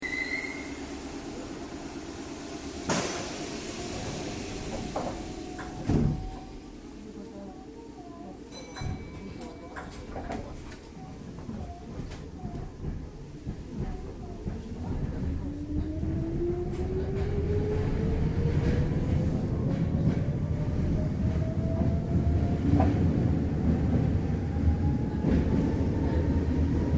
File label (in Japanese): 6600系, 名鉄瀬戸線の清水〜栄町間が開通した際に投入された車両で、6000系に準じた構造です。; 東大手〜清水（瀬戸線普通）